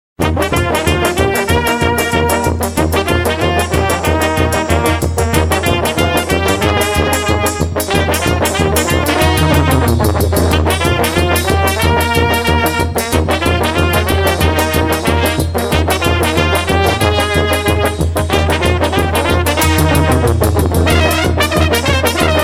bulgarian dance; ringtone

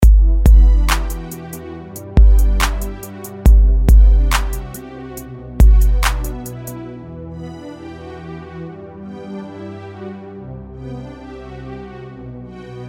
R&B instrumental

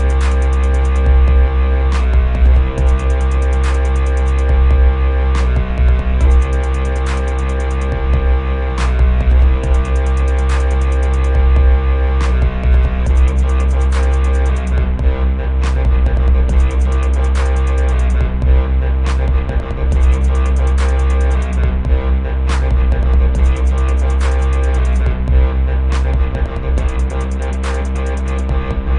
retro organ dubstep

